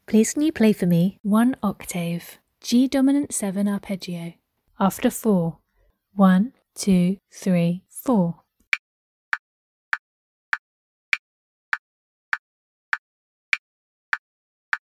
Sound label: g dom 7 arp